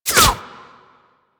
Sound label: Flak Cannon Alt Fire Pass By; Warframe; UT Flak Cannon